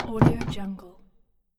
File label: old schooner boat utility room door